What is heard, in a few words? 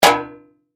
Impact Metal Bang; Materials